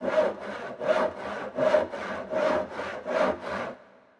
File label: saw short; Mount&Blade Warband